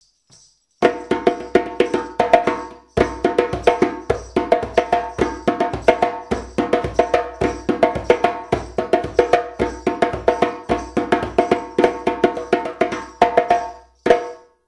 Kuku, djembe

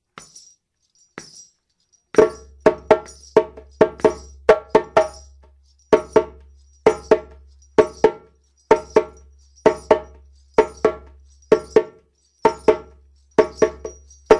Kuku, djembe

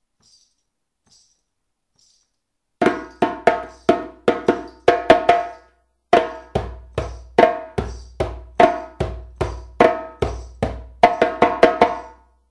Moribayassa Break, djembe

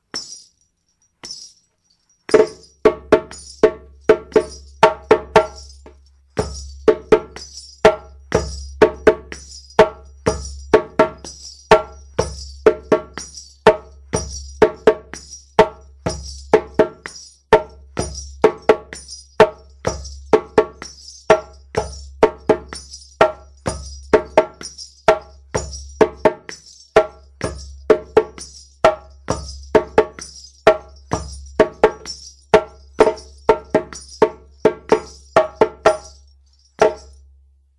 Kuku, djembe

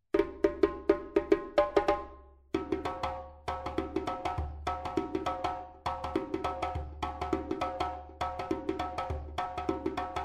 Kassa, djembe

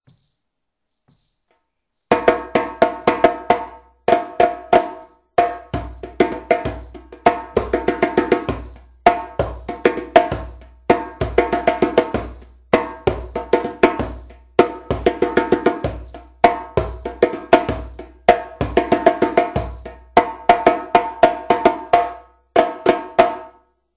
Abondan, djembe

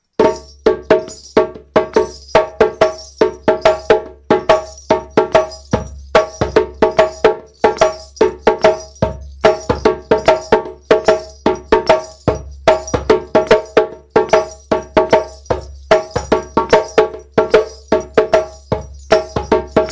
Djansa, djembe

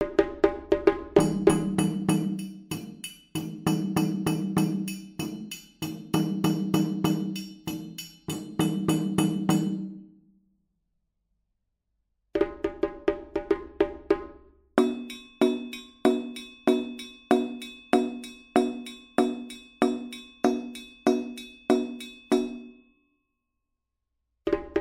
Moribayassa Dununs, djembe

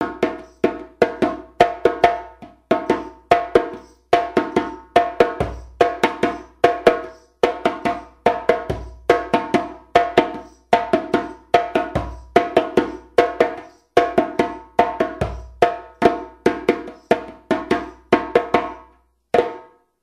Sunun, djembe

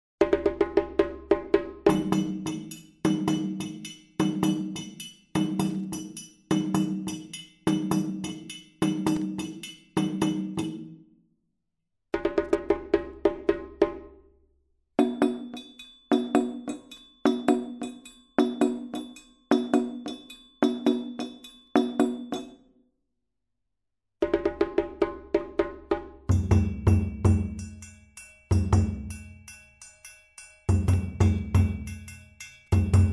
Kakilambe Dununs, djembe

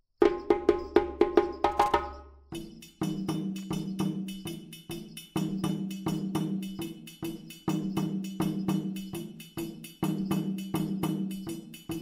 Djagbe Opbouw, djembe

